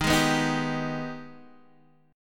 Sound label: Ab Minor Major 7th